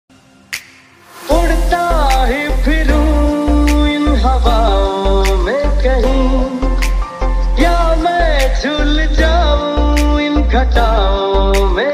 lofi vibe; saregamamusic

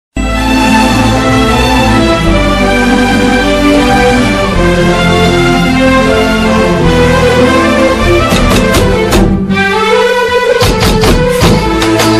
sad ringtone sad bgm sad love bgm; yaaradi nee mohini

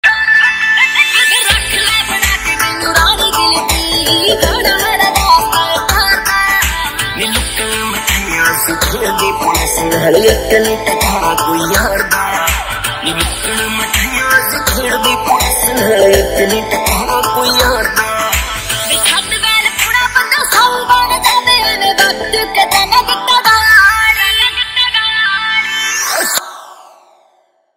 song lofi ringtone

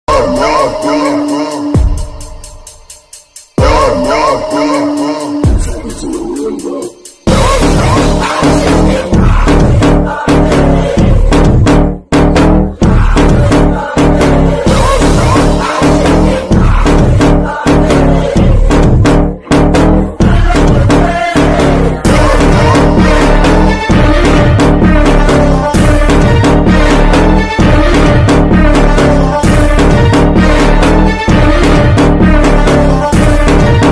post credit bgm; goat bgm ringtone

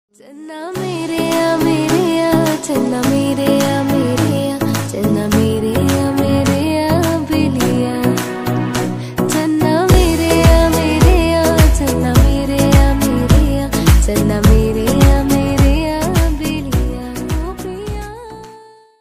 ringtone female

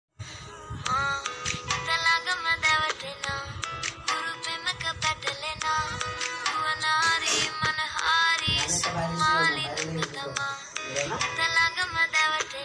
ringtone ringtone; instagram trending bgm

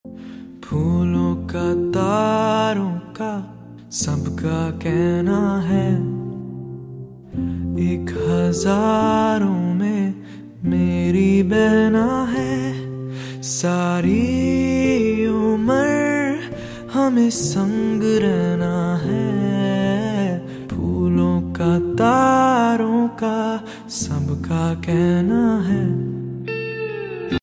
song bgm; jigra

